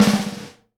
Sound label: SNARE RUFF, Live Snares